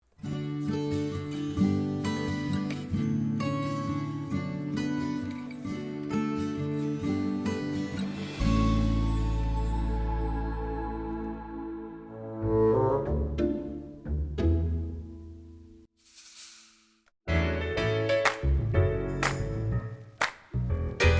MUSIC ONLY; Verkade; Mini Nizza

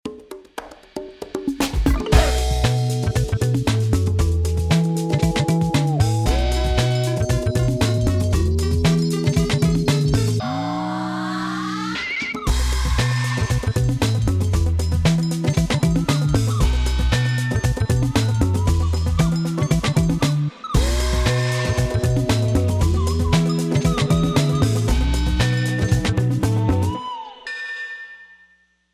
Funk

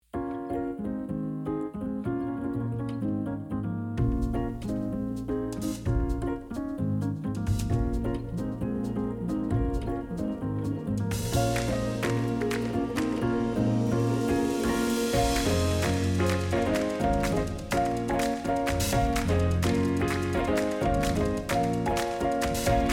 Instrumental; Emma Sleep

